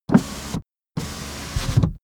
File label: car window front close unsteady